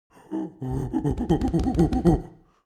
Scared monkey thumping chest; khi

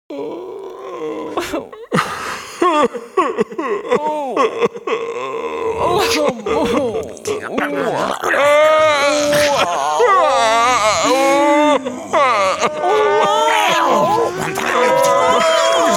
Creature sad crying

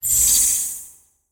Video game magic item unlock; ao thuat

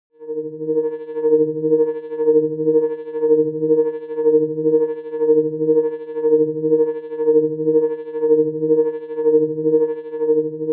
Sci-Fi waves scanner

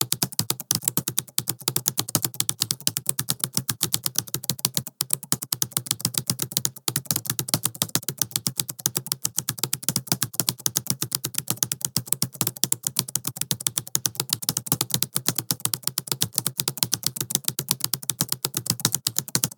Fast laptop keyboard typing; may tinh xach tay

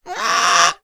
Cartoon creature calling

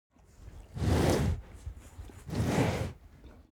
Cow animal breath

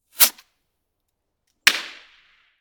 Small firework explosion; ban phao hoa

